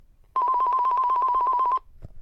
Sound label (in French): Telephone GIGASET DA710 Sonnerie